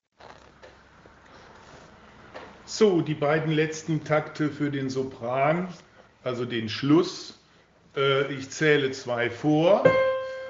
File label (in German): BN Sopran atempo